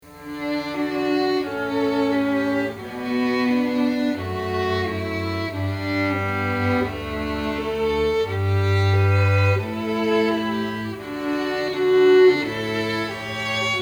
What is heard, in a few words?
Canon Trio; Pachelbel; Classical String Quartet